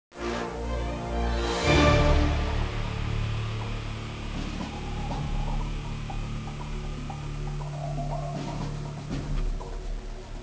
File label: won round, musickits; musickits, CT; csgom; cstrike